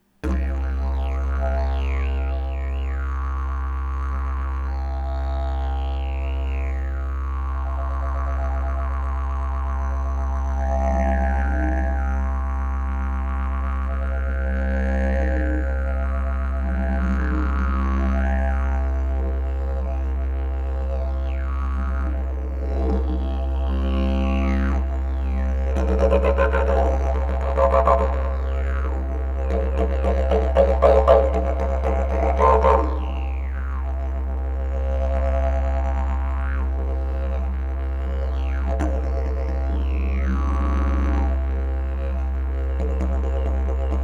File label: didgeridoo